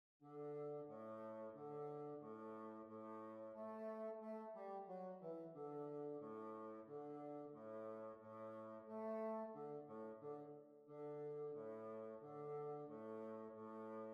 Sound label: Hornpipe; Miss Richards Hornpipe